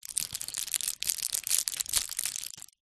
household medicine paracetomal foil strip handling